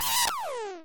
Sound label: pain; World Of Goo